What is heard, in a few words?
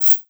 perc shake